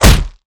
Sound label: punch hit; weapons; deluge